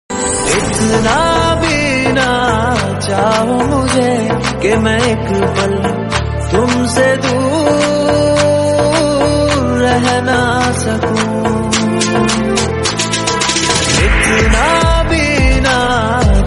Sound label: remix hindi